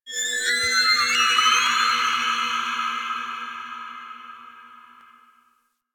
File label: Ocean Underwater Creatures Small; Warframe; Grineer Ocean